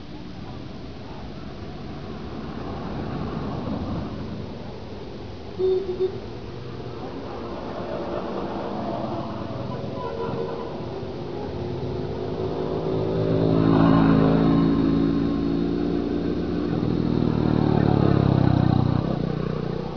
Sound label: traffic, subway